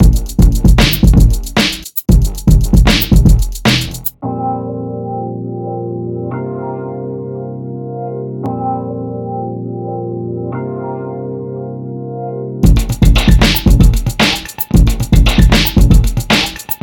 beats

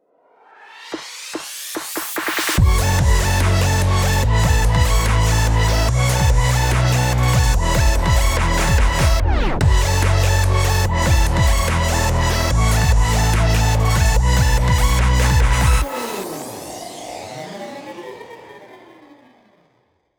Electrock

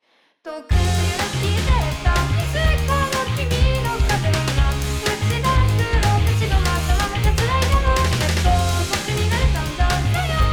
Noise

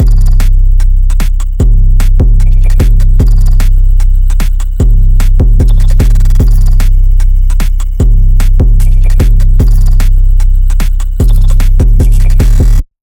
alto

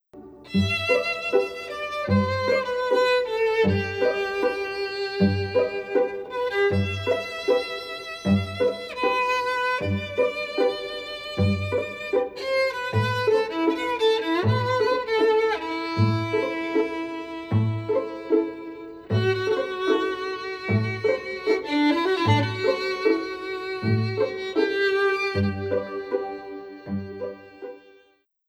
Violin; 雨女

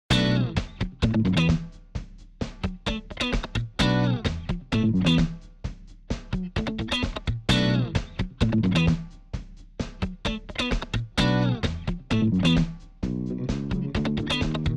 Funky Groove

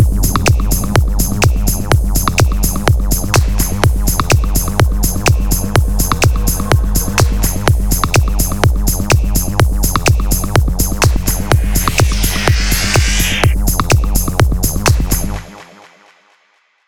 electro psychedelic

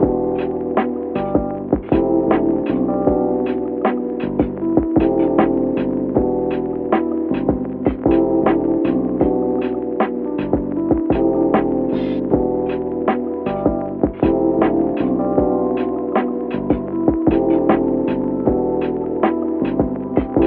78 lofi

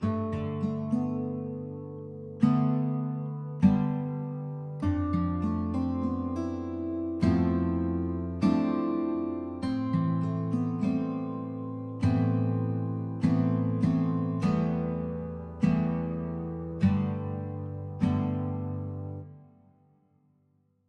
struggle acoustic

